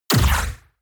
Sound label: sci-fi puffing burst; schusswaffen